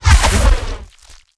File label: dragon tail